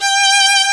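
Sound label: STR VLN, violin; AMG - Now CD-ROM (Roland); Art of Samplng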